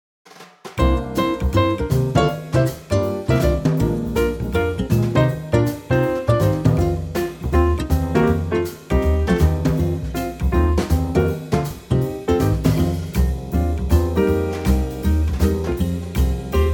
F Quartet

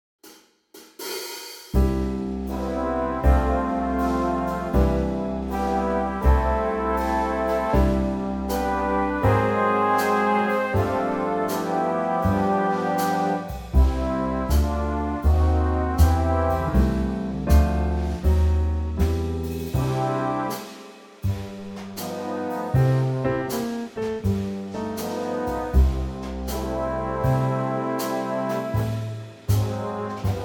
Big Band; C

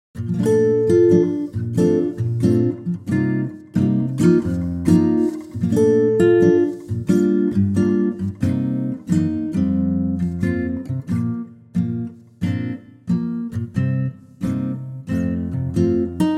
Bb Acoustic Guitar

